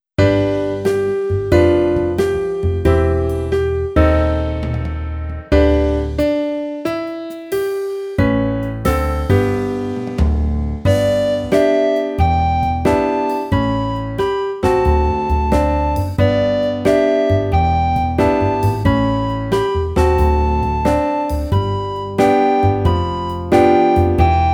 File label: Ten Toe Tapper Practice, recorder